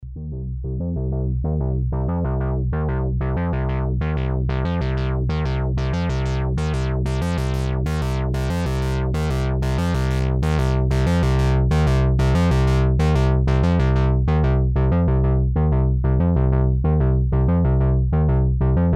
Filtermodulation 517S

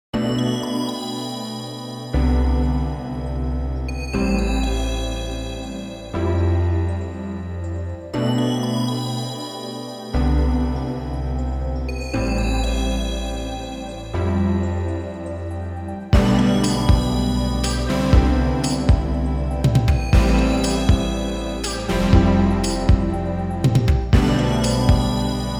Savant Audio QRS Hardwaresynths mix

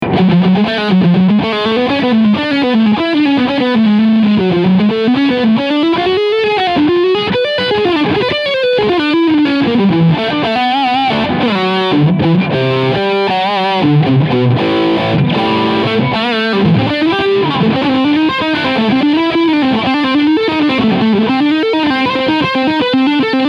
PRS Custom 24 Floyd Leadsound Steg Humbucker

